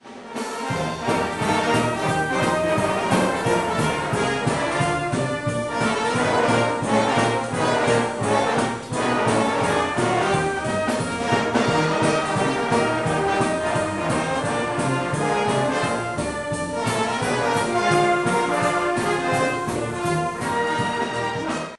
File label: carnival, brass band